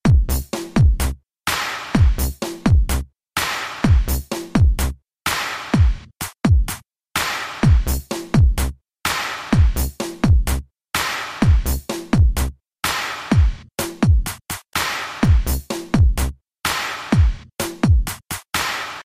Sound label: Fun Slow